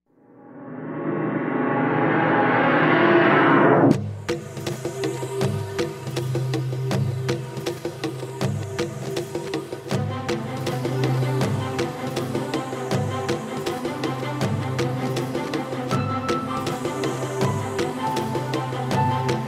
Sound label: Jungle Run